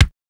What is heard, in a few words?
Kick, Fairlight CMI III